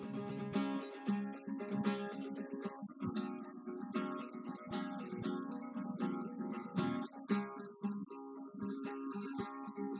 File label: nodrums inst sim; SiSEC08; SiSEC underdetermined